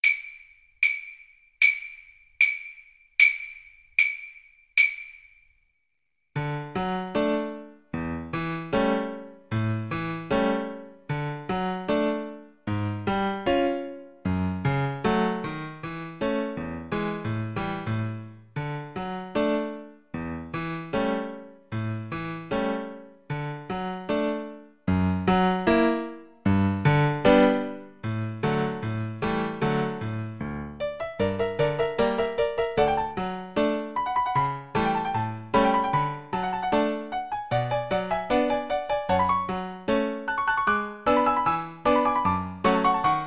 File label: hours kar, Flute